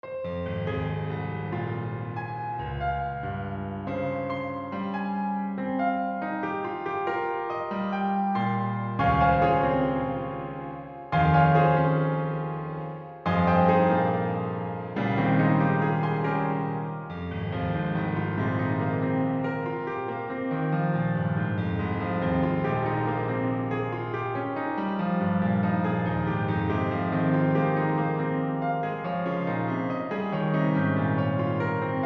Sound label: Preludes No.10 in Fm